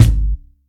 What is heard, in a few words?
loud kick drum a sharp key; kick drum samples